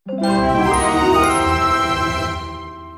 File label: Solve Puzzle; Mario + Rabbids Kingdom Battle; Spooky Trails